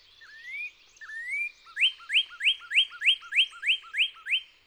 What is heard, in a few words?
wetlands noca